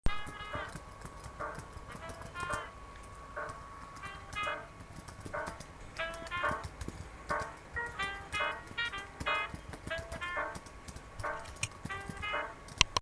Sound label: typing with music